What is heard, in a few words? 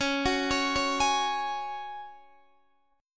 C sharp Suspended 4th C sharp down Guitar DADGAD